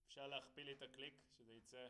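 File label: snare top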